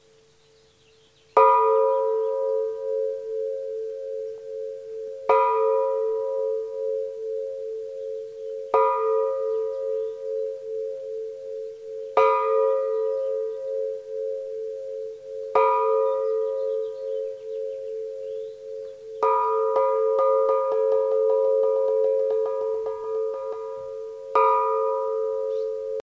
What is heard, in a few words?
Dinner Bell